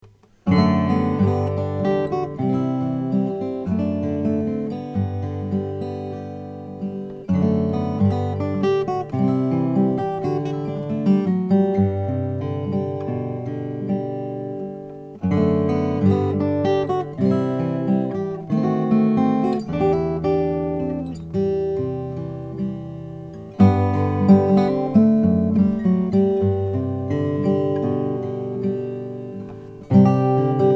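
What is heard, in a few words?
guitar; laurie williams kiwi special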